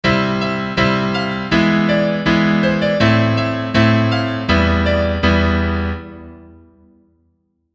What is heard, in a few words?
non diatonic